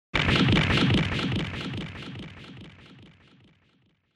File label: Motion Fracture